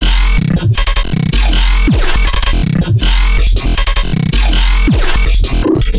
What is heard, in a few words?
Bass Loop 160 F; Trap and Dubstep; Ghosthack; Free Trap and Dubstep Samples 2018